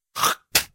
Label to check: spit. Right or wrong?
Right